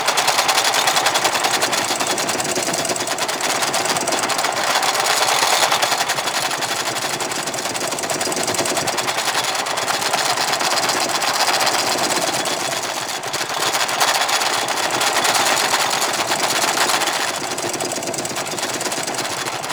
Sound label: Rolling Drone Loop; Grineer; Warframe